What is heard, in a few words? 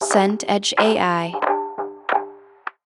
footsteps wood